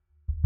Sub

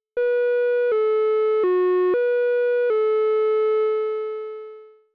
MOOG

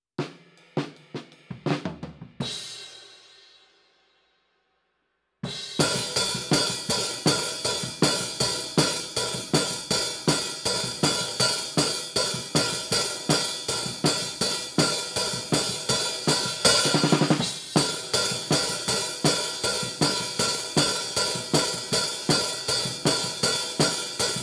HiHat, 160 BPM

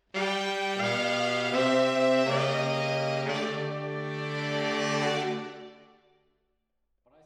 MKH AB, piano

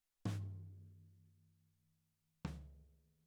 SNR Top

